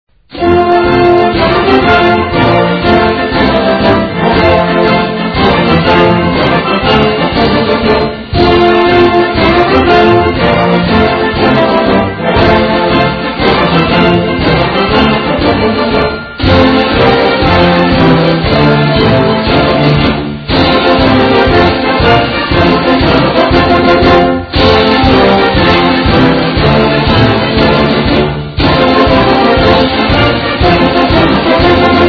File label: Fight Song - Victory Clog; Notre Dame Fighting Irish; NCAAF Football